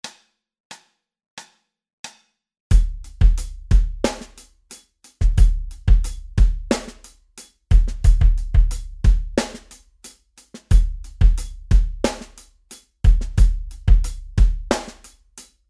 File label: Drum groove slap tempo 90